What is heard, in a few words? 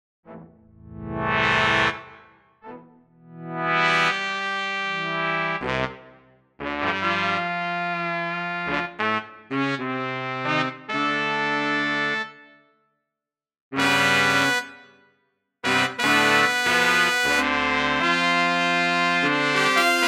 Hot Brass